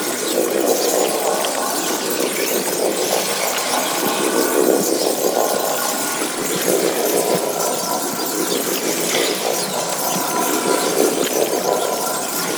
Brimstone Beam Drain Loop; Enemies; Abilities; Warframe; Tau; Roathe

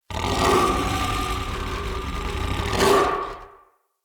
Battle Dog Intimidate Growl; Animals; Vocals; Snc Battle Dog

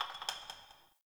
Brick Crumble; Warframe; Ambience; Nineteen Ninety Nine

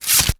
Stab; Sacrifice Forest Night; First Ballas

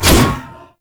Scythe Spin Whoosh, Weapons; Warframe; Stalker Scythe

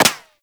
Bullet Impact Default; Warframe; Weapons; Bullet Impacts

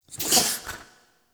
Idle Chuff; Tagfer; Warframe; Ambience; Entrati

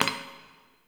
Idle Heavy Hoof Stomp; Tagfer; Warframe; Ambience; Entrati

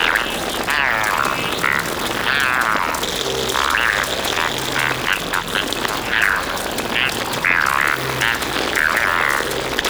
drone loop; Gameplay; Entrati; Vaults

